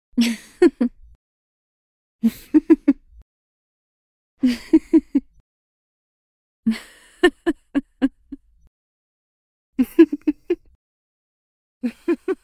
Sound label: woman chuckle